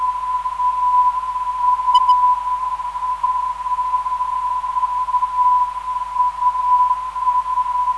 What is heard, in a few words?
346kHz, ndb